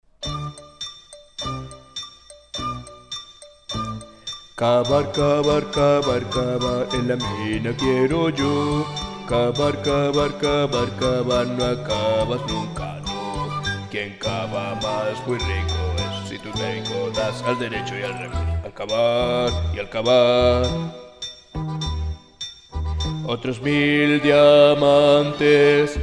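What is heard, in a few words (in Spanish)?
cavar cavar bajos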